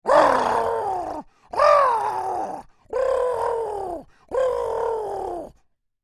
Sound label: Creature Oodle Bird Calls Angry; Birds; Animals